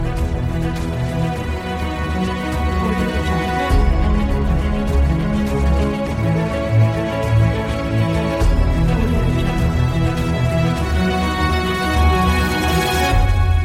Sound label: battling, bgm